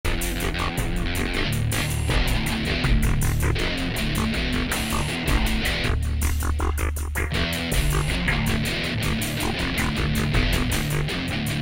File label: metal moment bitloop